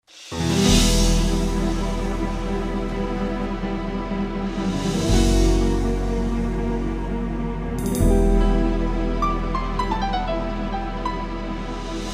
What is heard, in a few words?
New Tremolo Strings